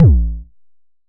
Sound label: 909 A1, Kicks; Drums; MBase 11 - 909